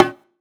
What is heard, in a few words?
Perc; Sample Banks; Percussion